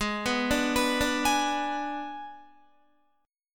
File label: G sharp Diminished, Guitar; arpeggiated; 15,14,14,16,14,16; 4F,4A,5C,5G,5C,6E